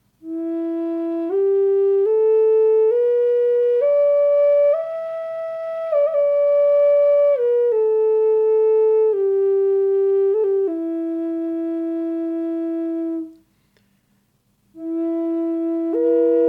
Native Drone Flute Indianerfloete Doppelfloete F 432 ohne hall; aromatic cedar; drone flute f 432hz

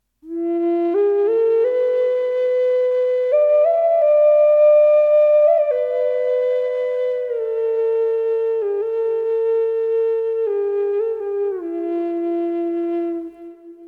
F440 mit delay, drone flute

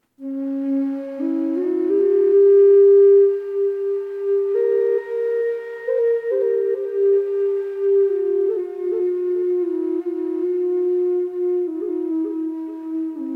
Cis 440 Bass delay, drone flute indianerfloete doppelfloete cis 440 hz; ahorn

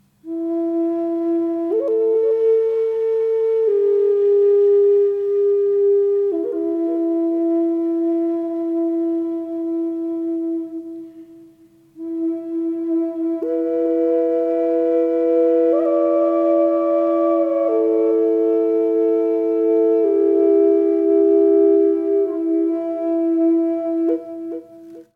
Native Drone Flute Indianerfloete Doppelfloete F 432 hall; aromatic cedar; drone flute f 432hz

